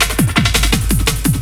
LOOP SD, Drum & Bass, 170 BPM

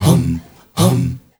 MALE HUM; Spectrasonics; Heart of Africa

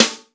FUSION KIT; Northstar - New Gold VOL-1

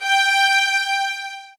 SOFT VIOLINS

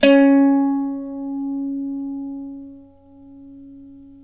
c+3, Mandolin; Guitar; Terkelsen

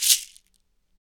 SHEKE, Afro Shakers, Shekeres

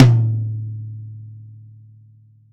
tom, velocity tom; VELOCITY TOM 1

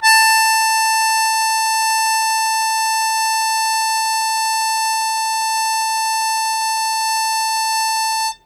Long Sustain A2, Harmonica; Zero G - Harmonica

